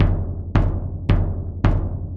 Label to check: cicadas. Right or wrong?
Wrong